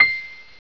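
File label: grand piano C6; Alone In The Dark Demo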